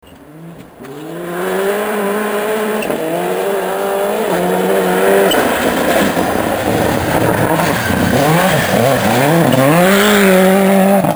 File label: Rally Sounds Toyota Corolla Wrc